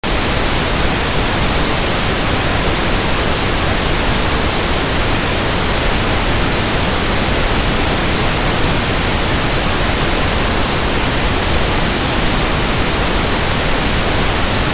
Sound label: Pink Noise